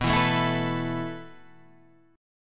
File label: B 7th Suspended 4th B